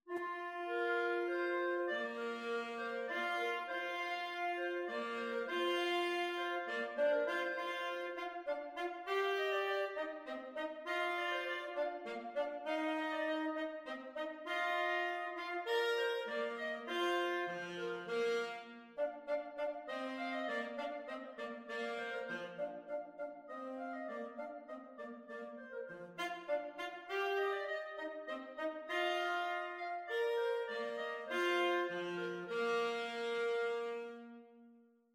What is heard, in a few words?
clarinet tenor saxophone